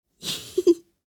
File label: Little boy giggling